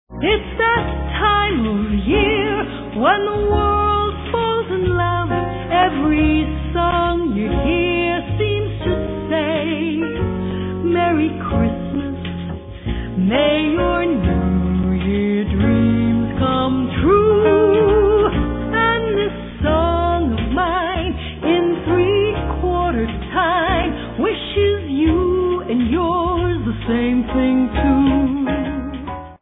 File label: waltz